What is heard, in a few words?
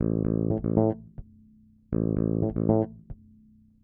DEEPHOUSE BASS 125 A